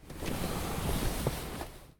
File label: exit vehicle